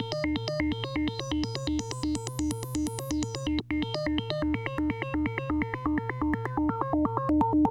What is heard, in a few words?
126, SYNTH SEQ; AMG; Global Trance Mission VOL-2